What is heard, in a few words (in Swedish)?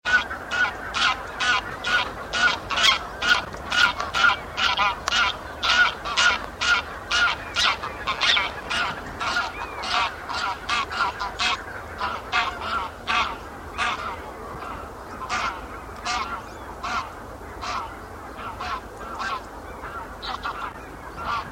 Gragas; Greylag Goose; Anser anser; Sander Bot